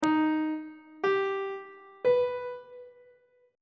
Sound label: e flat augmented triad chord intervals on treble clef